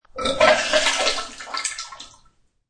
Disgusting wet vomiting sounds into toilet; Vomiting (Sound Effects:Human)

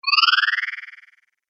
Magic Power Up; Magic Sound Effects

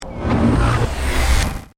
System On, Accent, Transition, Spacecraft; Production Element, Imaging Element; BlastWave

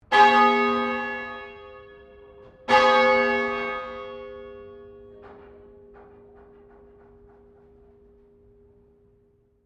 Bell St Pauls Cathedral Melbourne Bell 2 Rings

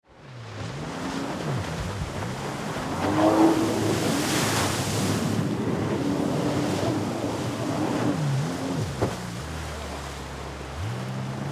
Vehicle Jet Ski Activity Ride Around; Boats (Sound Effects:Vehicles)

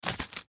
Plastic Bag Set Down Carpet Small Metal Objects; Nuts Bolts Nails Screws Tools; Foley

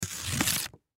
Cardboard Rip Tear; Cardboard Rip Tear Fast; Cardboard Sound Effects; Materials; Foley; Blastwave

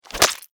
Household Newspaper Squish Bug Splat; Splats; Objects

